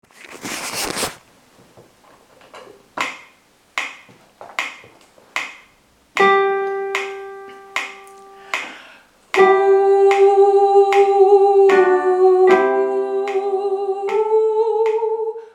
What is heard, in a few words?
sopran